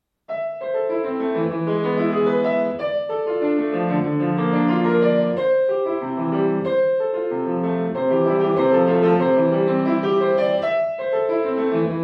Arpeggien, Klavier

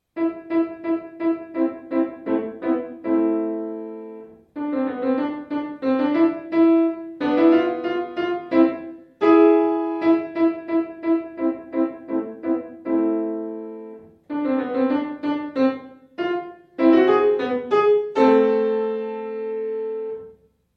Im Trab, klavier

